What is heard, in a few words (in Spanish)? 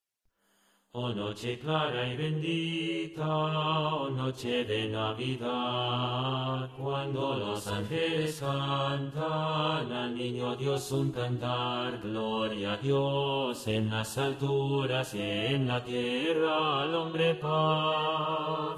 tenor; oh noche de navidad